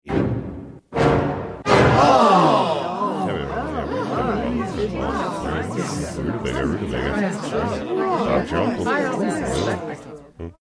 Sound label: mumbling along with shocked crowd drop